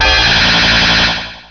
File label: SHOCKER; special effects